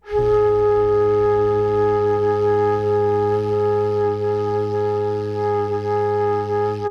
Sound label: FL HUM G#4, FLUTE HUM; Greytsounds - Philip Wolfe David Crozier's Saxophones